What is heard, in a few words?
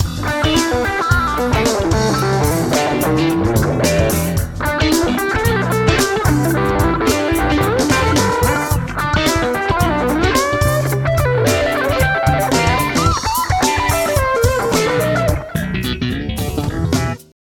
Jazz fusion